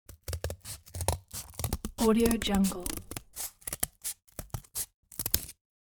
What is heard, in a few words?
cutting cardboard by scissors